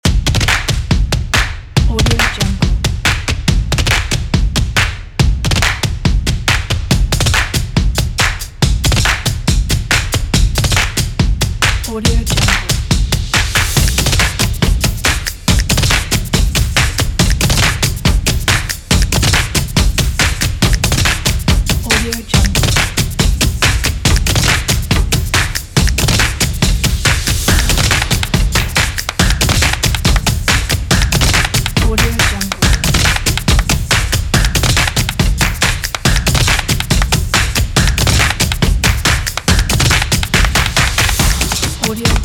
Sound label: claps and cajon